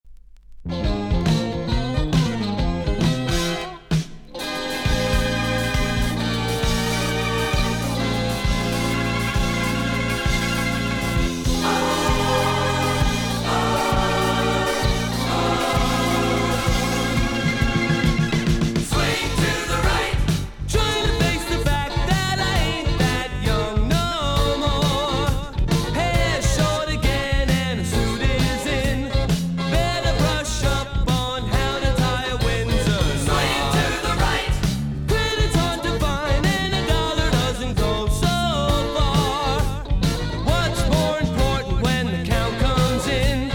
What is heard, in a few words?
swing